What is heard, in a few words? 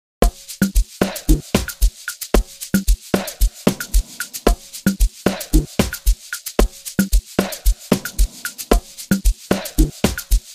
Amapiano Mix